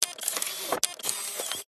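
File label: science fiction robot tiny take picture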